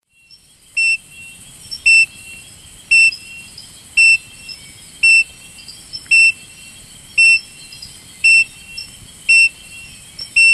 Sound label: Platypelis pollicaris